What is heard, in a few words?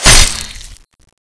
acid trap knife hit